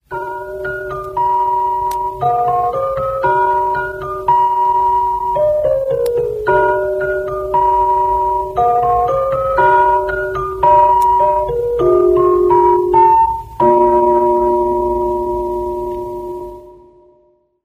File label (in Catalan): Jingle historic; cadena SER